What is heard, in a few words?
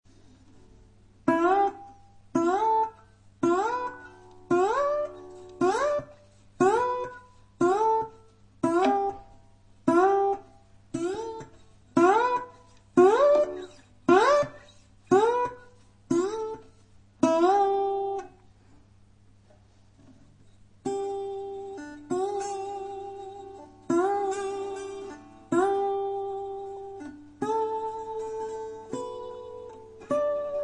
Slide, blues